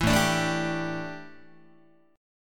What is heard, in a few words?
A 7th Suspended 4th, A, down, Guitar; A7sus4 strummed